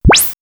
Ascend, synthfx; X-Static Goldmine

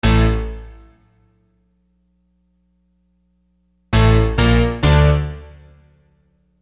EGrand; Construction Kit; HIP-HOP; Sonic Foundry (Sony Creative Software)

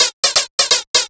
127 DRM, Fresh Disco House

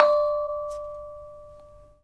KONGWONGLEK D5; Spectrasonics; Heart of Asia

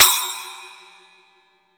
SPLASH, Drum; East Collexion; Drum 1 Dry

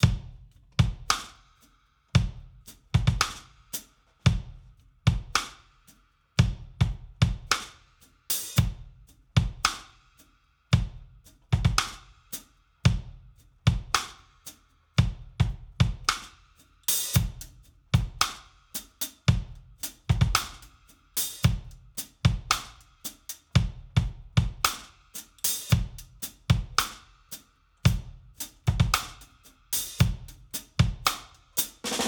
Miss U 112 bpm, drums; Basement drums vol 1

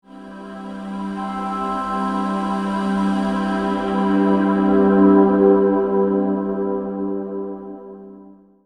Digi Pads; Jungle Frenzy 1

